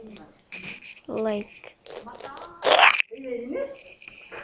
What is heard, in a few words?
rake